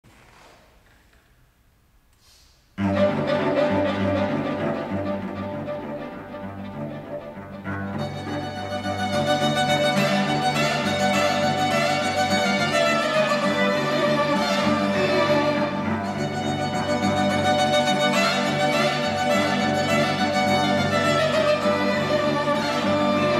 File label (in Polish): KWARTET Taniec z szablami; A. Chaczaturian